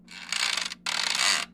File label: spring bed cracking, coil